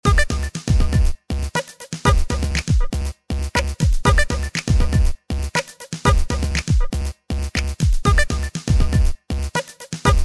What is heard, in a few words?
beat